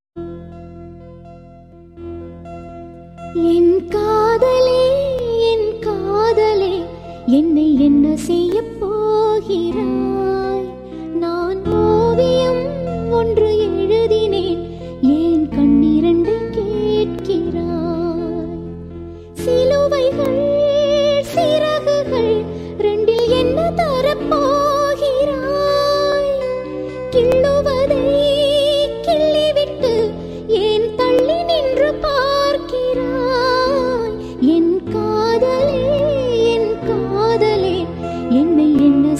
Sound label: Female